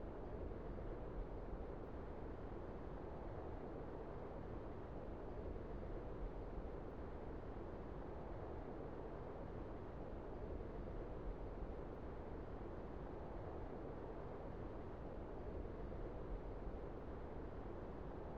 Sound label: wind bkg; STALKER; IWP